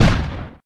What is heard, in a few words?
bomb